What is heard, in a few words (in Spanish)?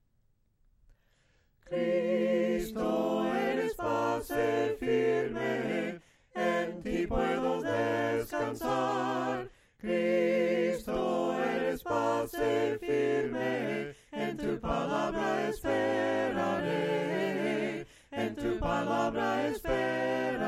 CRISTO ERES BASE FIRME, himnos a capella; cantos del camino